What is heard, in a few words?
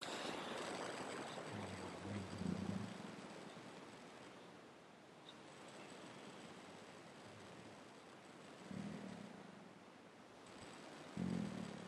purring